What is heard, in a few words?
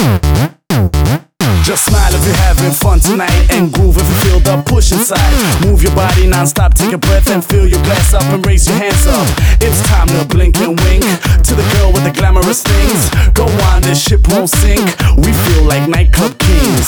Rap Night Club Kings Mix, 128 BPM; Vocal-Hook Kits